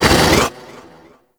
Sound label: Rock Elemental Attack Vocal; Warframe; Warframes; Brawler